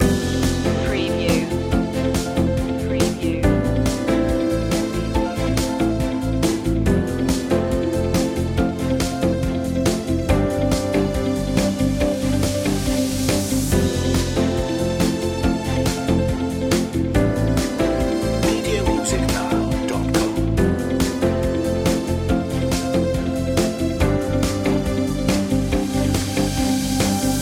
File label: new point of view loop